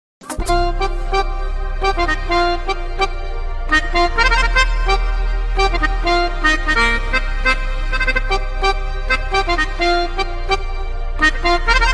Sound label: accordion